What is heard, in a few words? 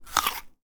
Apple Crunch